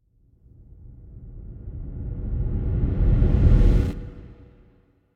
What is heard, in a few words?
Impact Distant Wooden Hit - Reverse; Hollywood Edge; sound designer's toolkit v2